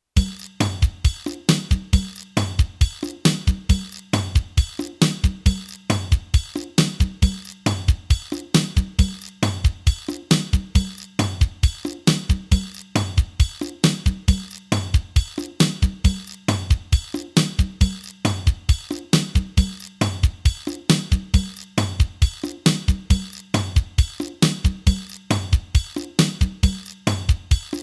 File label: Latin Fast